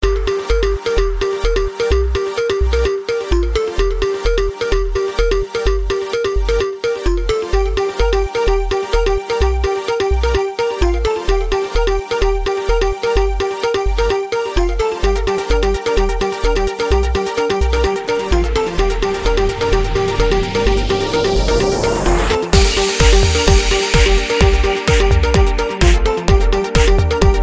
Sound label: energy, رنات هاتف حماسية